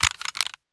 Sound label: p228 deploy, weapons; czero opforce